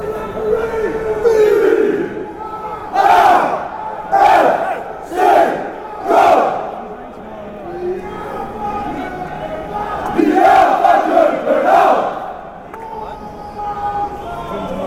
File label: chant; fc copenhagen